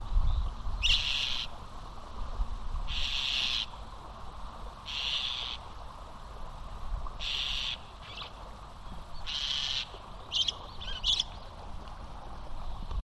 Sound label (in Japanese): bird mukudori